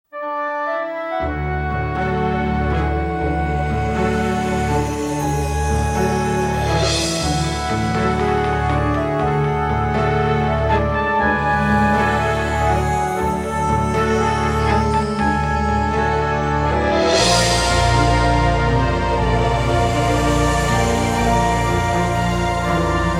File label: Bass Theme